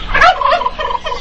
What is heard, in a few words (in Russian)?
indeika korotkii nezhnii spokoinii